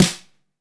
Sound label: METAL, Snare; AKAI S6000 CD-ROM - Volume 3